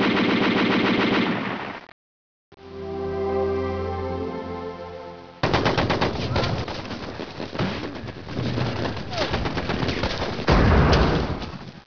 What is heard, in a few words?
background, beach